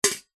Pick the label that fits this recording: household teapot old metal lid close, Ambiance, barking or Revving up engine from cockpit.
household teapot old metal lid close